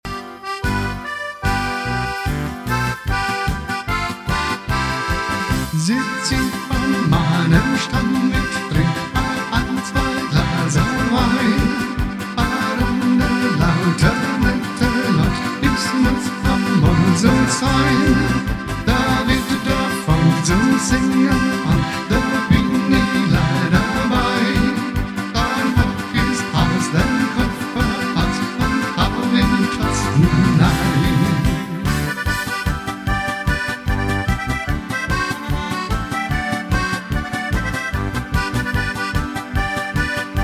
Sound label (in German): Harmonika